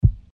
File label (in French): Coeur; bruitage